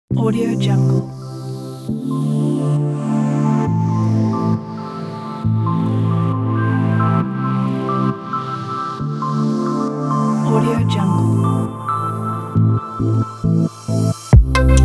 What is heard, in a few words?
digital abstraction